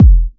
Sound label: Soft Kicks; Vengeance Samples